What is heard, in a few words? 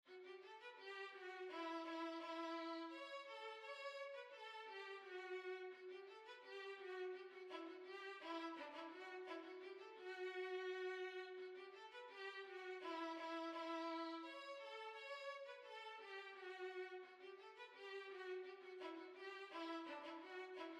Violin; Argiers